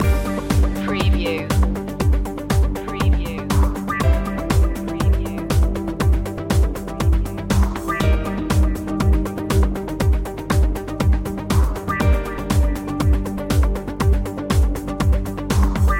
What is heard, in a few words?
perpetuum mobile loop